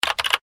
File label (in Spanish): Teclas